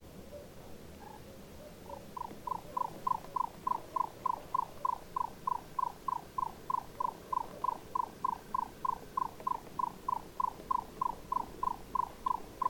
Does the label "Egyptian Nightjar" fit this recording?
Yes